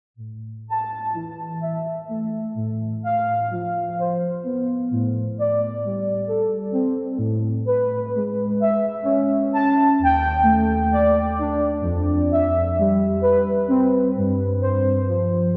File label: echoing fourths